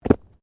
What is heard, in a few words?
Body movement Organic Impact Medium